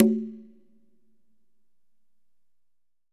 Congas wet, drums percussion

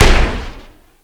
gun, gun shots; AKAI S-Series CD-ROM Sound Library VOL-6

